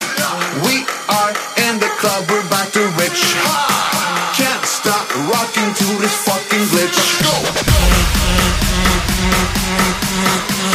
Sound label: Glitch